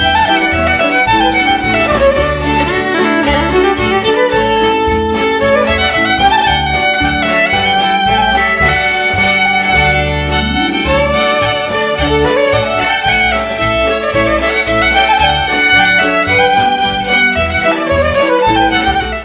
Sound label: german fiddle